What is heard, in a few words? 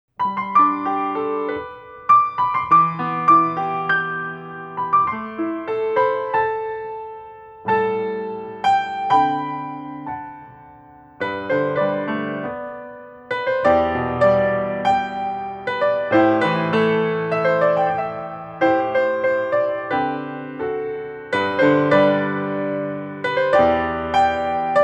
accompaniment; mulan